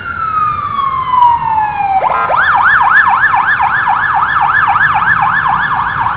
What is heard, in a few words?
Ambulance police